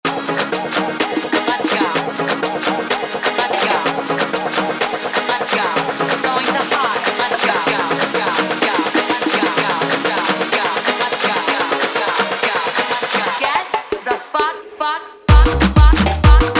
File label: afro remix 126 bpm